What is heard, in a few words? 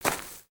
Footstep; Lego; Strombie; creatures organic